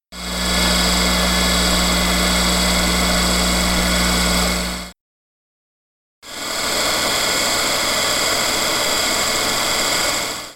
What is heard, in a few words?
bal unbal machine